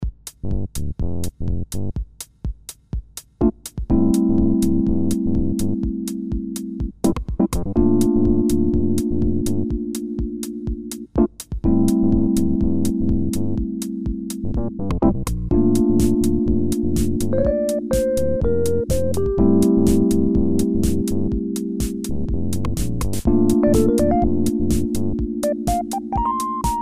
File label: disk haus